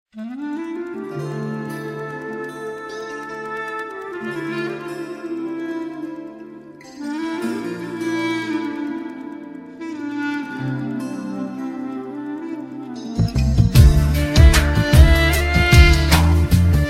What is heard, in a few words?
Romantic Intro